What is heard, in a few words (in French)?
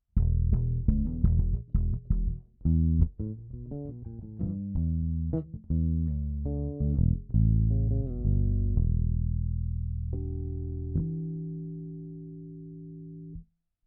basse